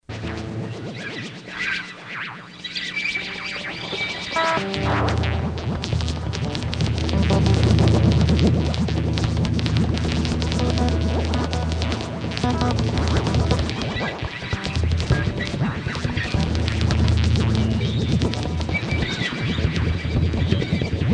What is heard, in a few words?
guitar homework